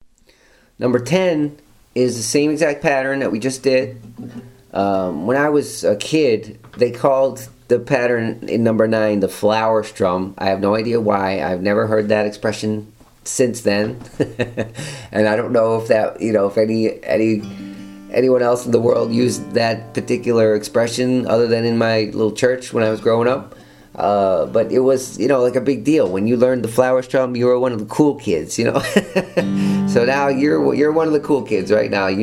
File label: strumming